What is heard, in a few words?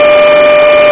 supercharger; gmod; tdmcars